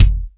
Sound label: short kick F sharp key